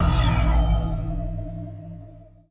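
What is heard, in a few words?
Telekinesis Start; Lucius III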